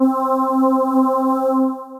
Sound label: Shimmerpad, Synth Pad; Instruments; Ableton; Synth Essentials; Operator